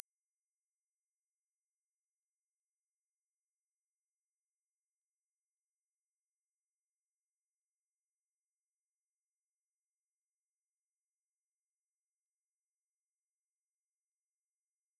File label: 18khz